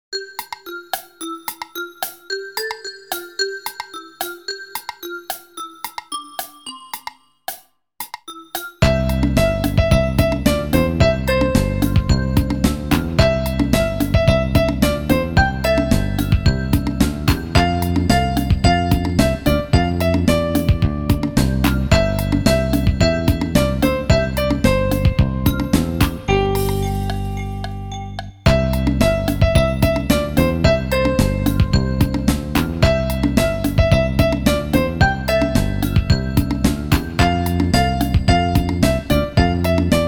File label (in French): VERSION KARAOKE; Alex et Zoé 3